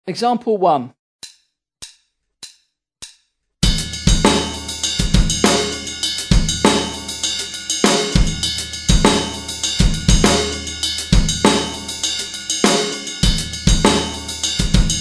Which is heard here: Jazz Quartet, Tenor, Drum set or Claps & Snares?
Drum set